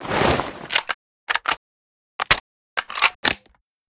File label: m40a1 reload; STALKER